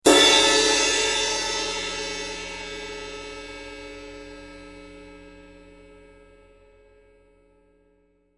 ZBT 20in Ride

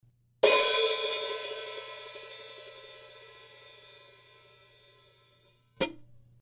S Mastersound Hats Open Hit, cymbal

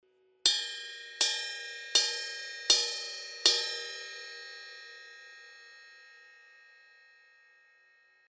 22 I Ride bell hits, cymbal sound

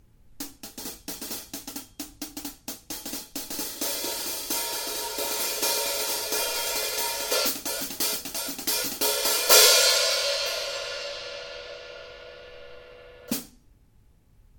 14in K Constantinople HiHats, cymbal

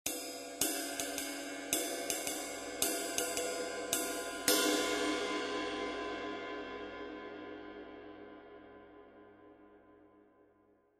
21in Sweet Ride, cymbal

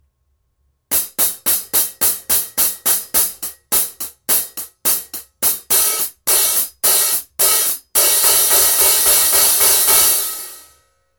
15in Heavy HiHats, cymbal

